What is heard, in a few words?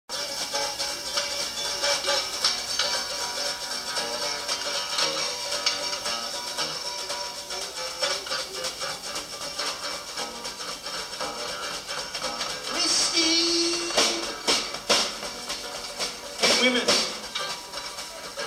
Duet for Giant Bass